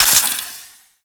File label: Quill Dart Gun Poison Mech